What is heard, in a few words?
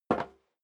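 step sneaker metal dry single left, footsteps; schritte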